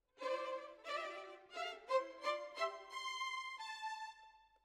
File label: Violins, jazz for piano